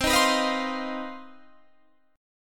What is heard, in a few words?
C Minor Major 7th Double Flat 5th C down Guitar Standard; strummed; JGuitar